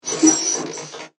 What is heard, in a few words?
Device Movement, Door, Panel, Open, Scifi; Tech